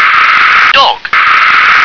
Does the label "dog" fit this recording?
Yes